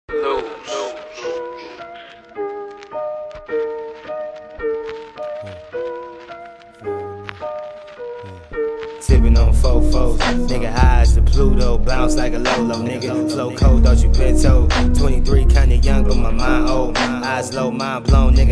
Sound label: Freestyle